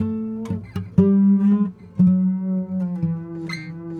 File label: Sarangi Down, Acoustic String; Sonic Foundry (Sony Creative Software); Friendly Fire